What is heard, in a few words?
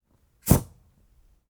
fire whoosh; prosoundfx